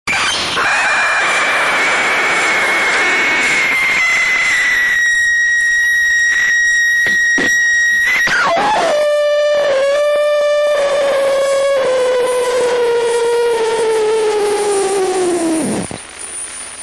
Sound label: jammer sound